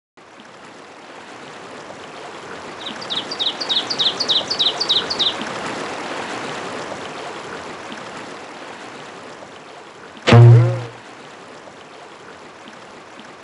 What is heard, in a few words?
Ending Cutscene Ambience; Garfield Saving Arlene